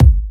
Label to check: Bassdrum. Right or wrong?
Right